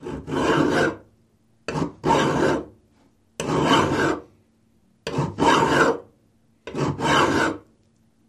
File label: Metal Plate Filing, files